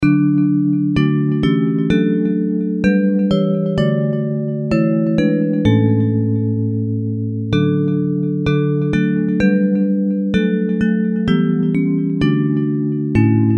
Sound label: Jesus Shall Reign Bells; Hymns; Praise